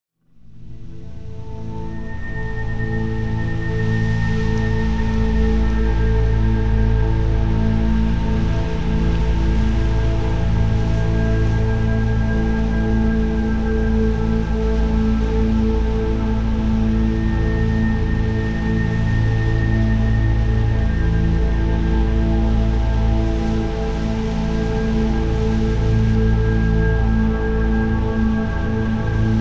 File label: Granular Texture Am 80Bpm WET; Ghosthack; White Wash